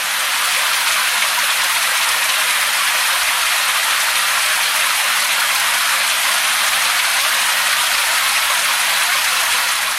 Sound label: heavy rain; Nature